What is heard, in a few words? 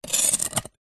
nature ice block sharp knife scrape